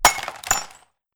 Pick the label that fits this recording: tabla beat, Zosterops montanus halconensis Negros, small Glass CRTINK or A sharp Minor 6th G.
small Glass CRTINK